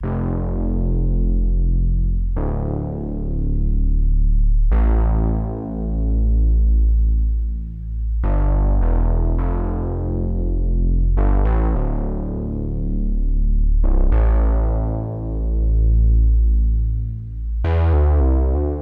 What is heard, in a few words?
102 BPM A Min Prophet 5 Bass; Synth; Cymatics; Fantasy Synth Sample Pack; Final Destination